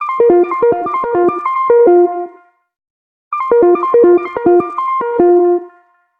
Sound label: arp, alarms; Nothing Phone (2)